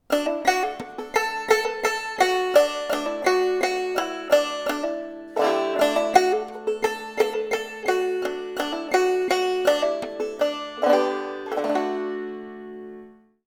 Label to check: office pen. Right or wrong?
Wrong